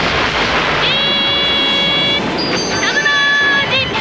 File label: deep submerge; Sailor Neptune